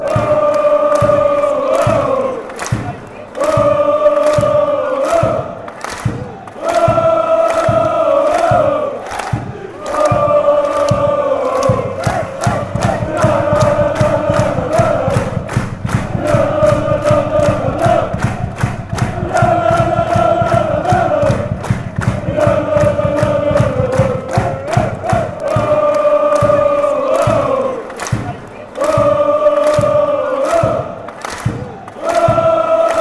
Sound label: jallalalala fan chants